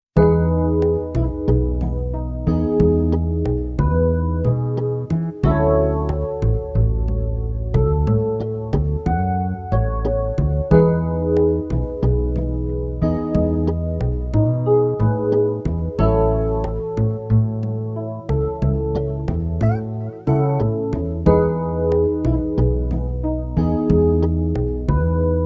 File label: Lounge Bossa; wizardry